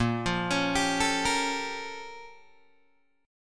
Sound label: Bb Minor Major 13th Bb, Guitar, DADGAD; BbmM13; arpeggiated; JGuitar